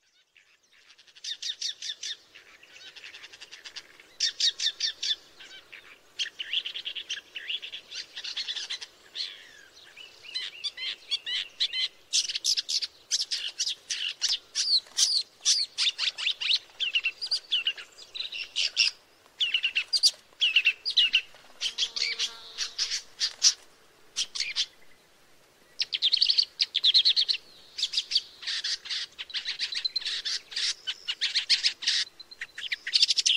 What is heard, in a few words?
Woodchat shrike